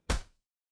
impact slam

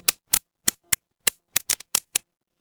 fire electric crackle

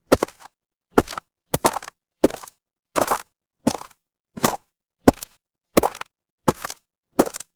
footsteps gravel

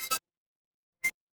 retro game coin

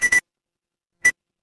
retro game coin

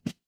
cartoon poof

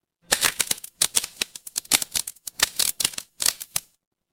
fire electric crackle

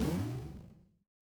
retro game jump

